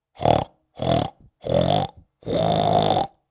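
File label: grunting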